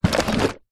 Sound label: stationery drawer wooden open; office